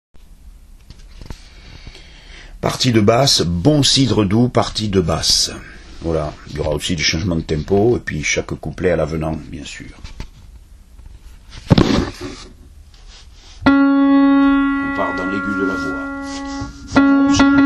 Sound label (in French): Adagio basses; bon cidre doux